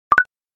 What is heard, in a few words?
Notification Sound Ringtone; Snapchat